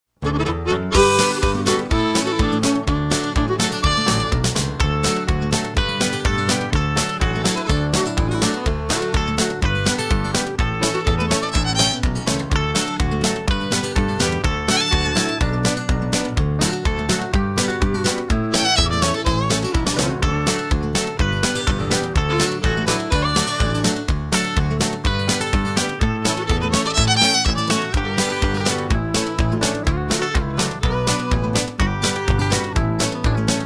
Square Dance Music

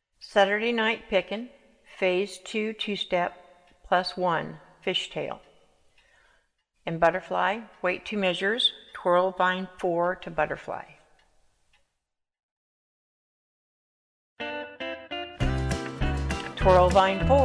saturday night pickin cue vocal, round dance

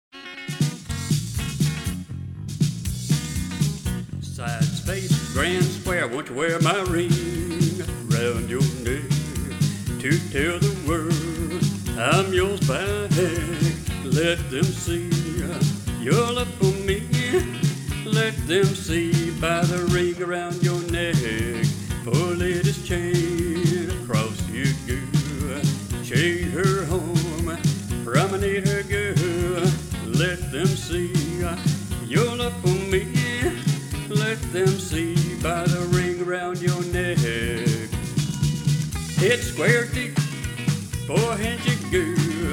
ring around your neck vocal; square dance music

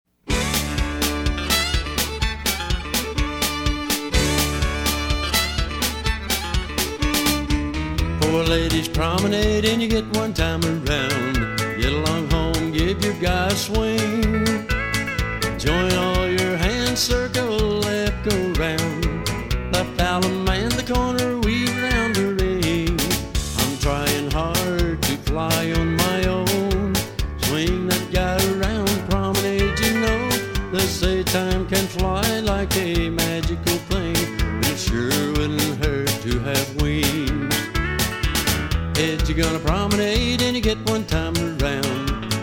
vocal, square dance music

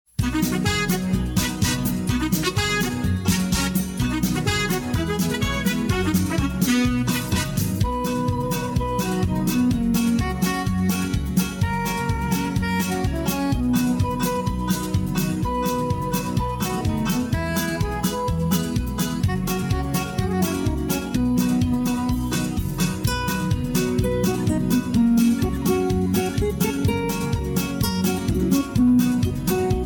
harmony vocal, square dance music

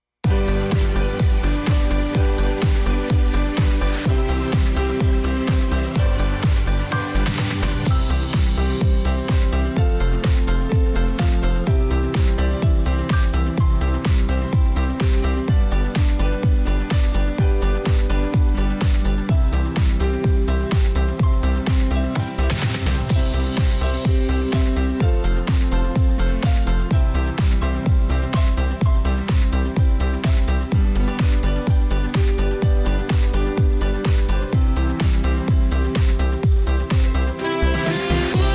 balalajka rhythem, square dance music

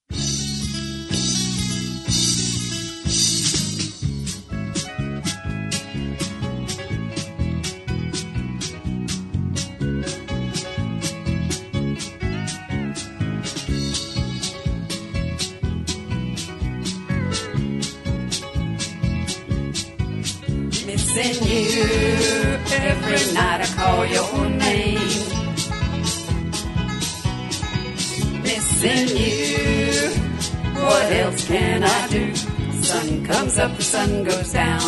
background vocals, square dance music; rainbow logo

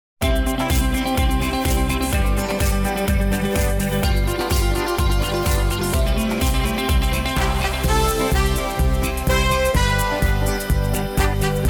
rhythm extended, square dance music; Silver Sounds Records

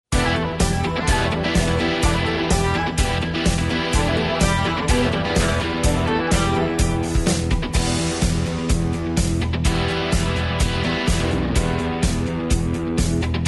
rhythm track, square dance music

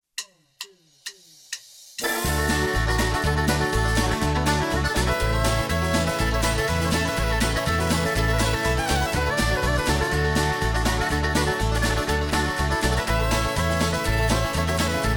hoedown, square dance music

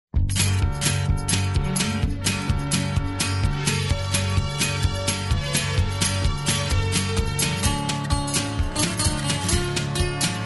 hoedown, square dance music

